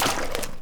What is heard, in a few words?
paint blob splat; Portal 2